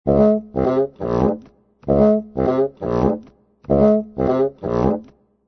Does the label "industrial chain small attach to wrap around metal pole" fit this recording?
No